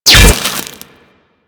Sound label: Bolt Impact Stone; Warframe; Weapons; Cross Bolt